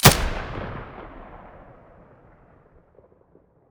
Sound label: muzzle stereo